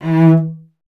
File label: Cello E; Miroslav Vitous; Solo Instruments VOL-1